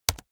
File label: keyboard laptop space press fast hard